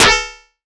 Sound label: PRC, Yamaha TX7 synth drums